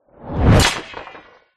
sound design shockwave whoosh into metal and debris smash